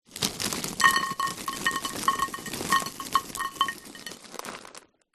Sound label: food potato chips crisps cheese puffs large bag empty onto plate; zapsplat